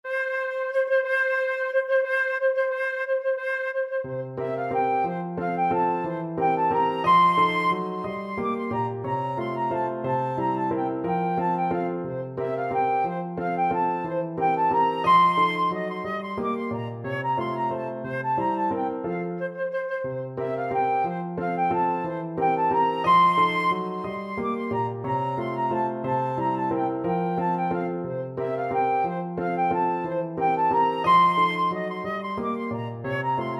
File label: Chopin Waltz 1 Op 18 flute